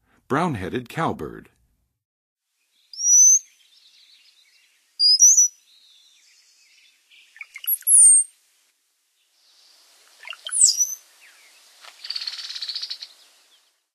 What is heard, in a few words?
Brown-headed Cowbird; North America; Western USA; Western USA Songbirds